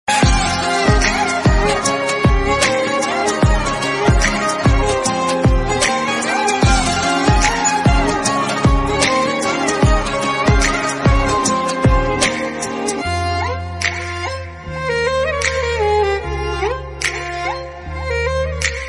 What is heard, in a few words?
Instrumental